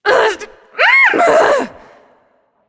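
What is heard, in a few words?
grumble, female; smite; voicepack; hera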